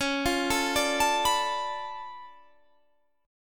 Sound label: D Minor Major 13th, C sharp